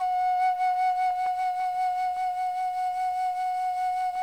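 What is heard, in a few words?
chamber flute; Sonic Reality; Vintage Timetraveler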